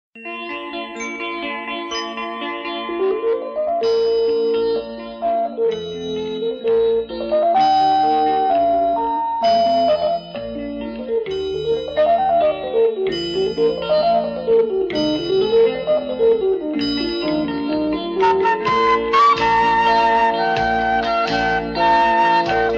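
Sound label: Instrumental, Flute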